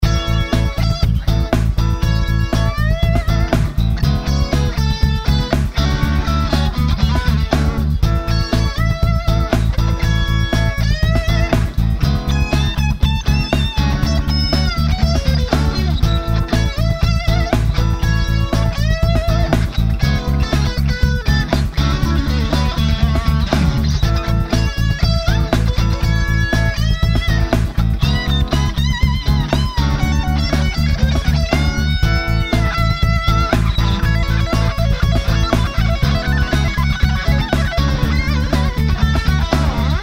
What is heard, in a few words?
Melodia